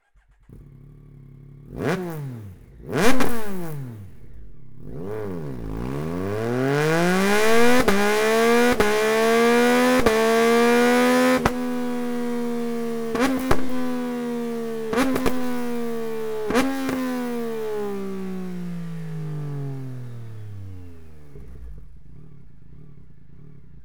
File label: Complete YAMAHA MT-09